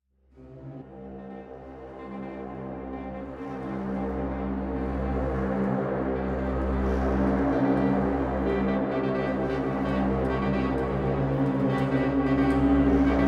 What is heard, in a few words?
Action Drone clusters